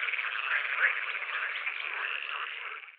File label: Pool-Frog, frogs